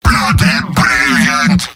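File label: positive vocalization, male; demoman; tf2; garrysmod; mvm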